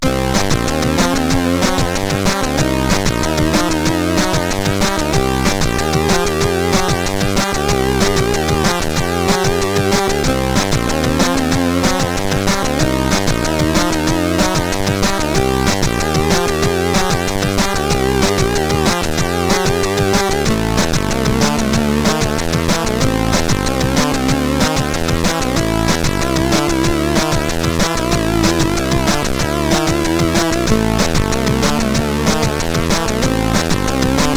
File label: Star Music, CSG8580R5